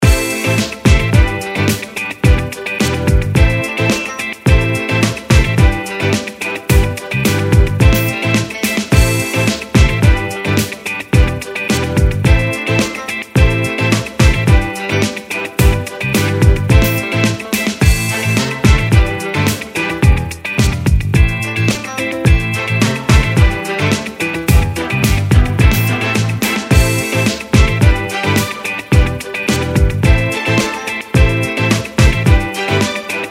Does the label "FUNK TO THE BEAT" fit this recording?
Yes